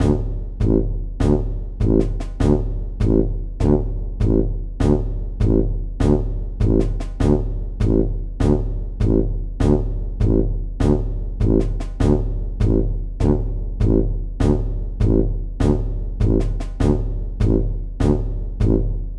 cartoon marching heavy footed fat elephants millitary drums